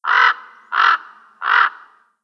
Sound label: raven; ambient soundscapes; dota